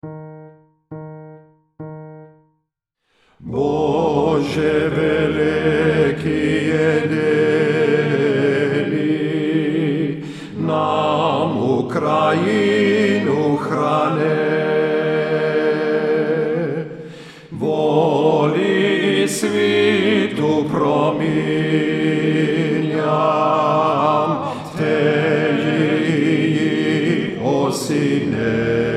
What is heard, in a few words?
Prayer for Ukraine TTBB Bariton